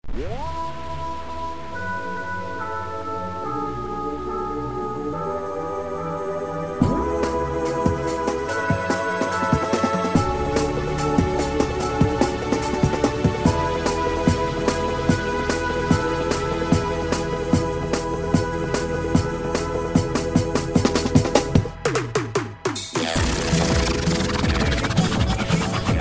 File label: DRUM N BASS